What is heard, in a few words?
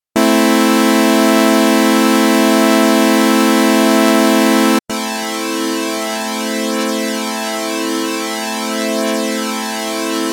vdimension saw